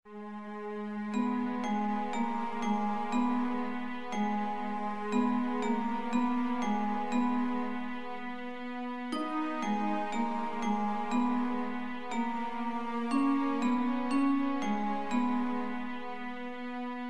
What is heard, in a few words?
slow string